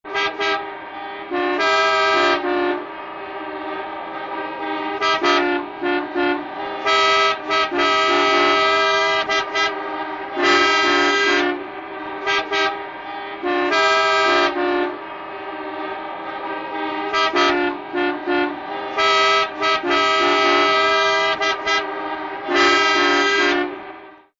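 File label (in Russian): автомобильная пробка старинных автомобилей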